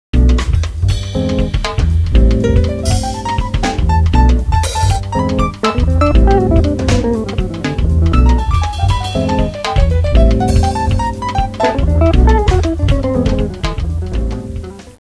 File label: cool jazz; Samsung SGH-i740